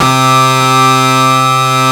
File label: WAILING, DIRE LIKE; East West - Global Sounds